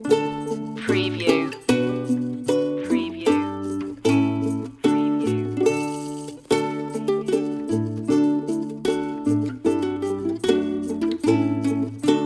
deck the halls with ukulele loop

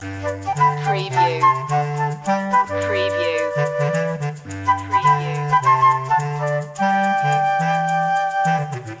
childrens calypso loop

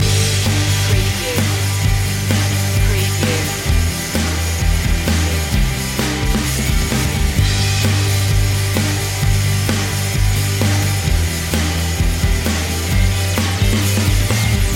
break out loop

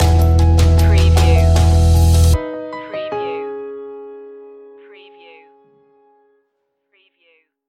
timeless stinger

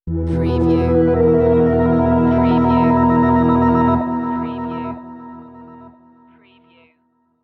Scifi Audio Logo Ident,Classic,Swirl,Echoey

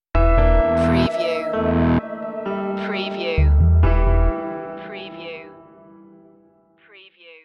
detective stinger

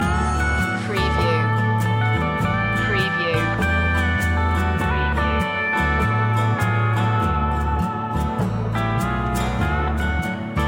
hawaiian lullaby loop

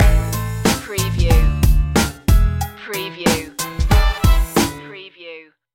hip hop from cuba stinger

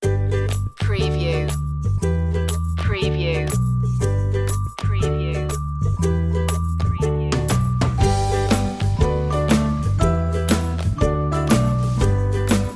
ukulele commercial cheeky chap

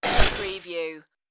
spaceship door close

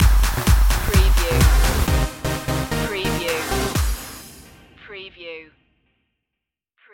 the source stinger

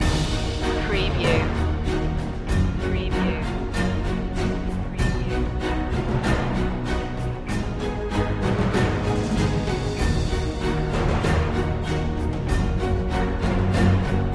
no choir

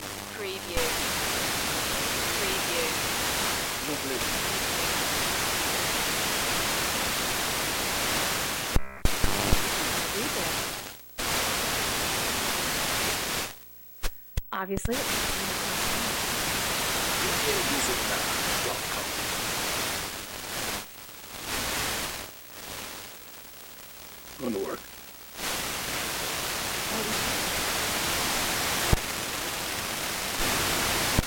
TV Tuning Static Snow Clicks; Quasar

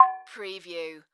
Multimedia Percussion

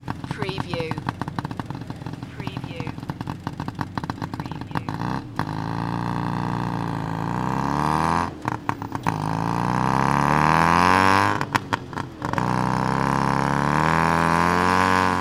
tuctuc acceleration

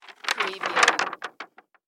Calendar Page Down

